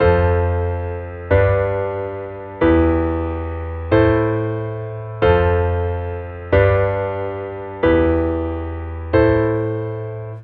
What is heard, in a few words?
piano